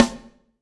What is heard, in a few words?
AMB SN, AMBIENCE SN